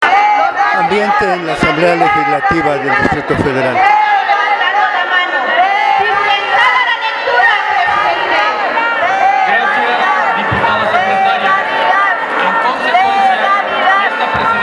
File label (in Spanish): sonido ambiente